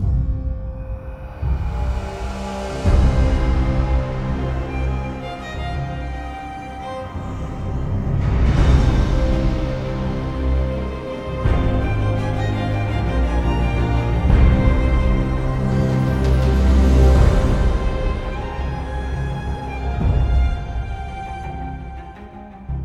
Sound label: intro free spin remix; netent